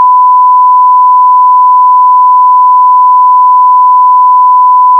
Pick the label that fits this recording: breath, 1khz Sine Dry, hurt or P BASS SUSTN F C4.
1khz Sine Dry